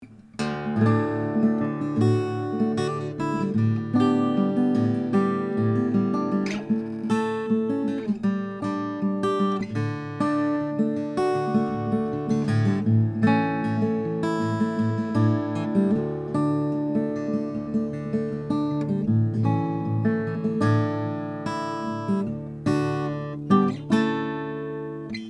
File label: 00 mahogany, guitars; santa cruz